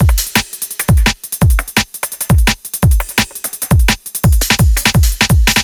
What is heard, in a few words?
Future Beat, 170 bpm